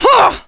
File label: jump